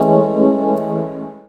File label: Chord; Blu Mar Ten; Jungle Jungle - 1989 to 1999 Samplepack